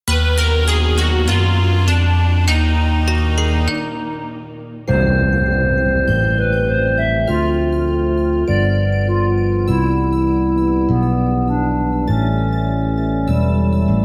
BGM Battle Lose

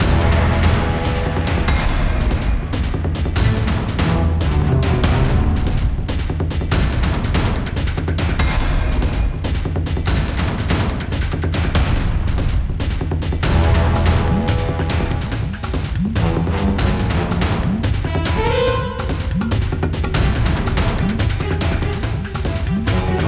BGM stage boss

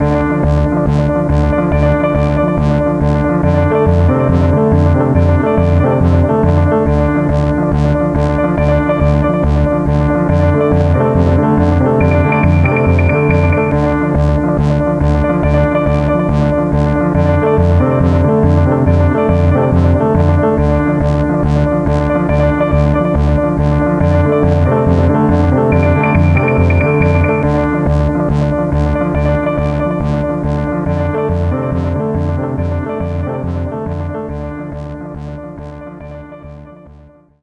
Match Music; Football Academy 2009

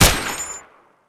DPM

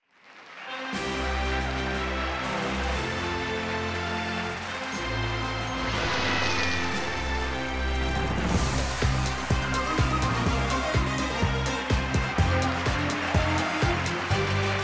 Intro Fanfare; buzz brain of the uk 2009 ps2

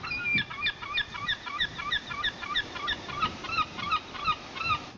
seagull; Super Trench Attack

